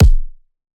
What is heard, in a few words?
Punching Percussion, Straight Hand, processed; 99Sounds